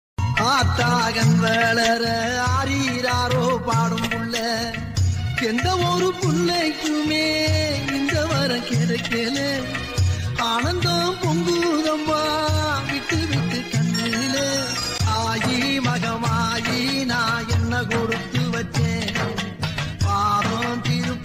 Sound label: amman song; rajakali amman